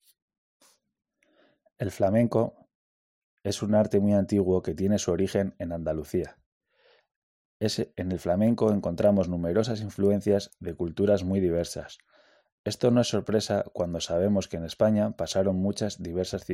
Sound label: flamenco